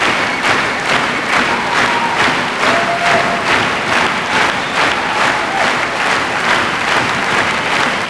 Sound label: applause